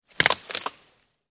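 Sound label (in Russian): a large branch broken off a tree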